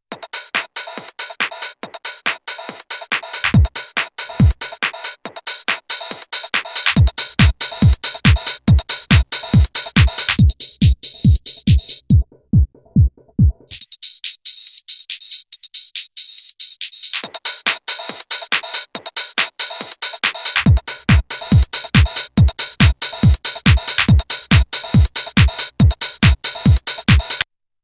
isolator

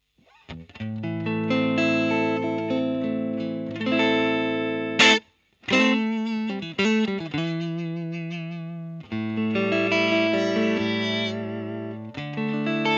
Fender Blues LTD

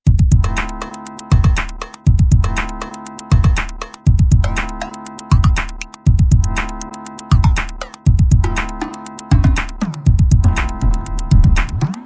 korg volca sample groovebox

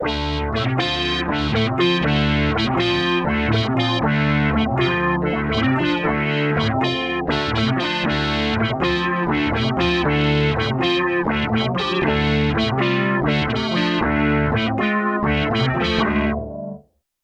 Boss SY 1 LEAD

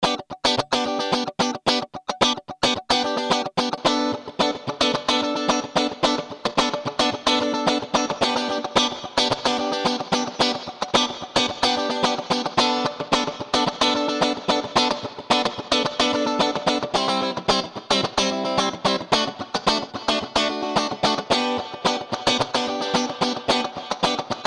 funky guitar

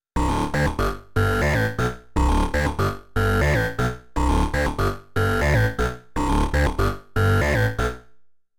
Studio Electronics Quadnic 4 Waves Sync

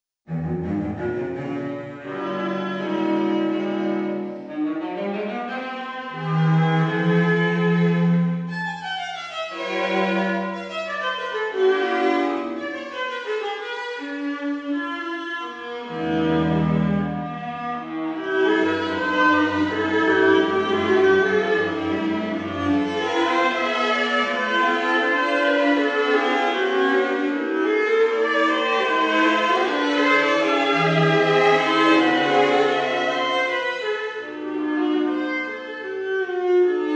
Trio wet

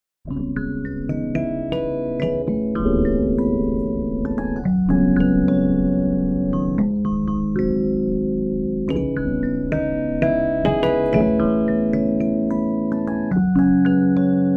2nd Harmonic

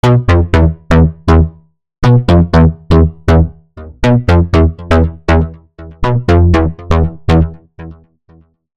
Distortion Gnasher; Waldorf Kyra

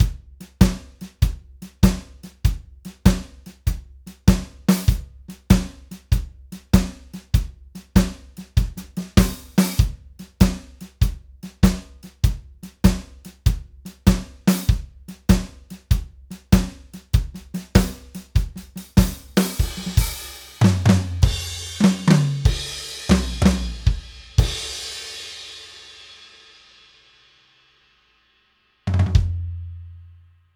Stereo Mix; EZdrummer 3

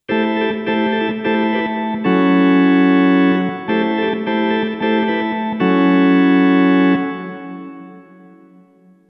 Flutes Pipes

